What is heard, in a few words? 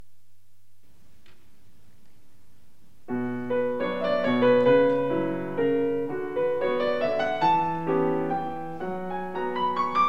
melancholic waltz, piano